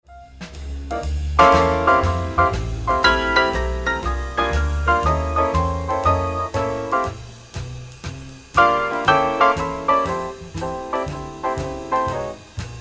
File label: Block Chords, Grand Stick in High Bass 4th tuning; three-note voicings with the left hand; Chapman Stick; On the Grand Stick in High Bass 4th tuning, this style can be approximated by hitting three-note voicings with the left hand on strings